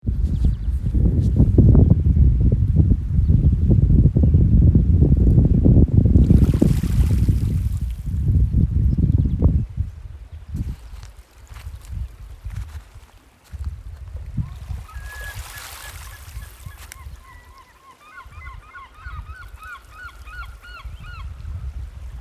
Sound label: Wind Ocean and Gulls; Bay Roberts Newfoundland